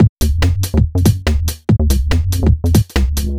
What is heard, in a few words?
garage; reason; mono